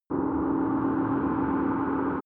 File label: windy dark shuttle